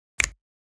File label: Finger Snap Stereo